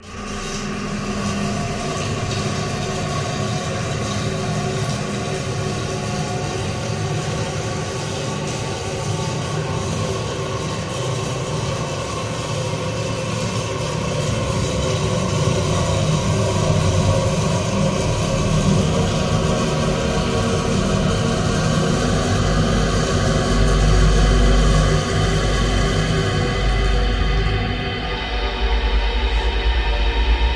Shower Scene Echoing, metallic

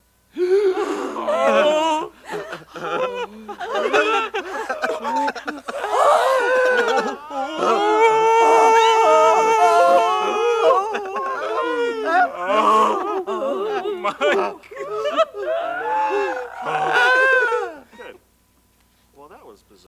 Grp Fear Worry, riot panic

